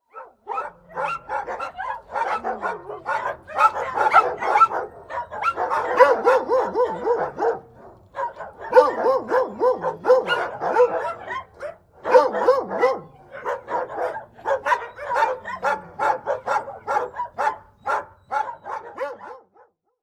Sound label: dogs barking; sound reinforcement; sound effects and sound reinforcement